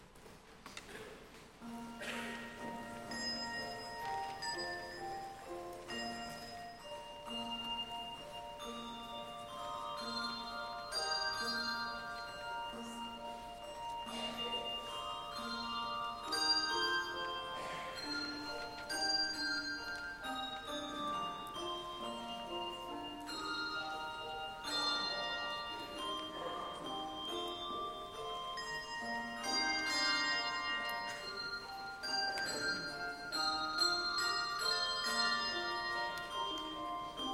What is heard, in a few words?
Anthem, Carillons